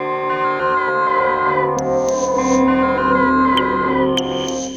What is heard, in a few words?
Ugly, Industrial, Nasty